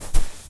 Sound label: Footsteps footstep sand run; ProSoundCollection v1 3